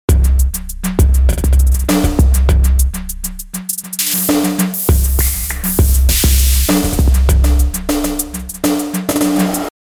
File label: loopermod; sugar bytes; effectrix